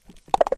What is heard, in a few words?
jokey moving; Racetrack Riches; megaboard